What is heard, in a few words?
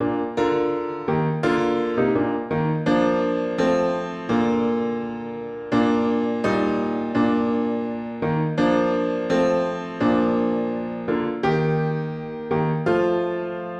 piano